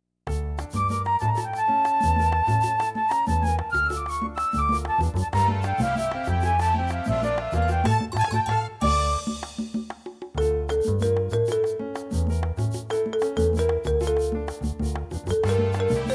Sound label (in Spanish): (No Vocals)